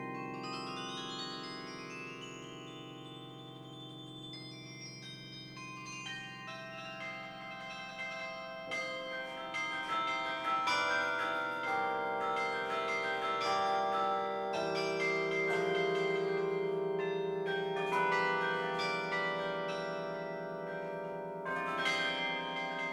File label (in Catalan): campana